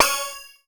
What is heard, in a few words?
C#, BELL GONG, Synclav FM; Big Fish Audio